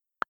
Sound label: space